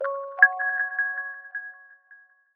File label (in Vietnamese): am tin nhan ngan cuc hay